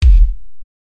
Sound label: subby kick drum sample f key, Royality free kick tuned to the F note; kick drum samples